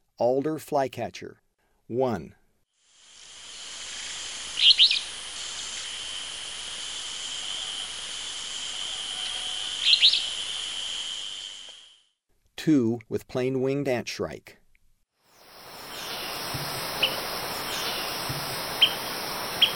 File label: Alder Flycatcher; S. America; Eastern Lowlands